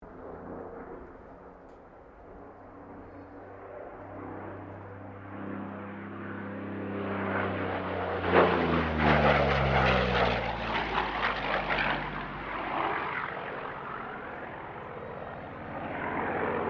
Aircraft P-40 Kittyhawk loop, WW2 Kittyhawk or Warhawk fighter plane; 1940s; Airplanes; Vehicles